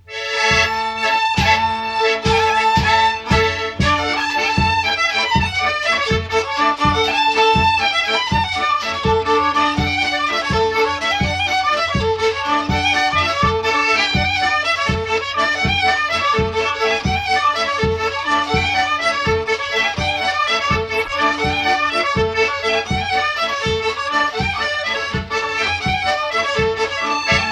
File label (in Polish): Oberek; Szła bido